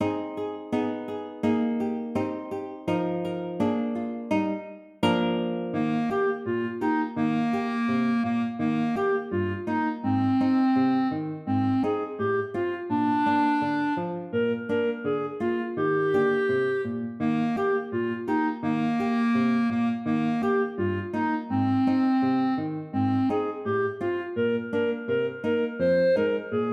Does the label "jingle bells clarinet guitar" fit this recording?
Yes